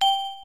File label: Info; WoWInterface; BigWigs